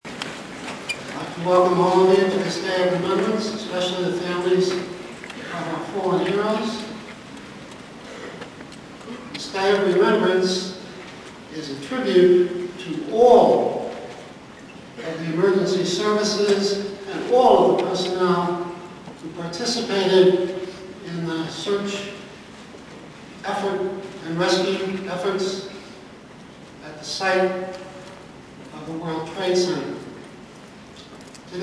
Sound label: pizzi